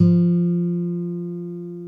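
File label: F2 classical guitar; InVision Interactive; Lightware VOL-1; Instruments & Percussions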